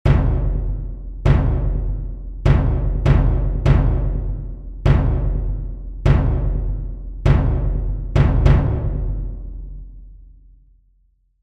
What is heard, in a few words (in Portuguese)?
Toque - Bumbo